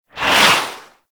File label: Missile Barrage Whizzby; Enemies; Abilities; Lasrian Tank; Warframe